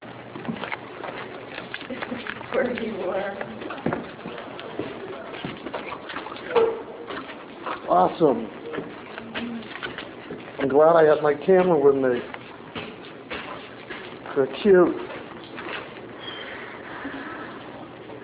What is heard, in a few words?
playing